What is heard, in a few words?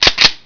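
shotgun cock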